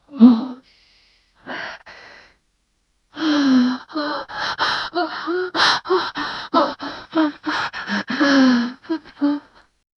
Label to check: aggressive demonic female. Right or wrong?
Right